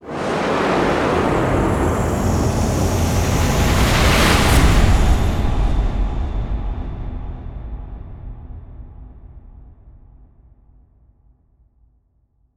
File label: Stinger; extinction deluxe edition; ps4 windows xbox one